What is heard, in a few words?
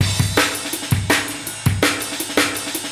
164.50 bpm Cymbal Break